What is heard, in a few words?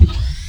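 cannon power up; allegiance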